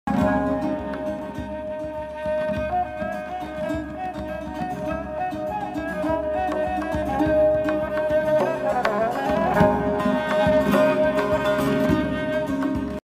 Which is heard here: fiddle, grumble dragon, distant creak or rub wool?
fiddle